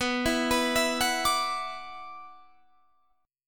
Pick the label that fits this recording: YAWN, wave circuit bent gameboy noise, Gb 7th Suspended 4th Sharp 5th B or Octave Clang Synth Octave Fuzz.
Gb 7th Suspended 4th Sharp 5th B